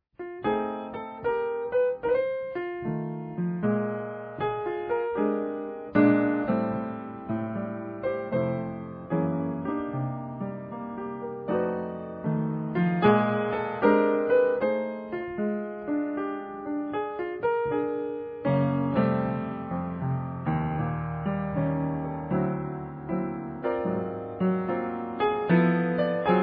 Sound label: Blues (Piano Interlude)